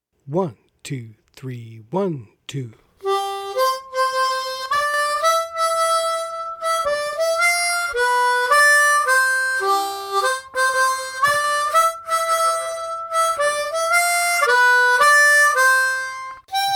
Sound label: Fast; O Christmas Tree